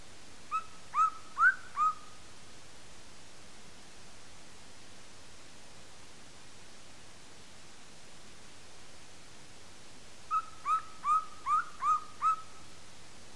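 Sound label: golden eagle aquila chrysaetos; orla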